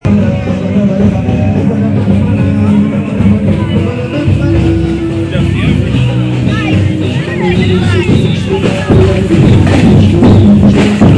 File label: Lord Mayor's procession; norwich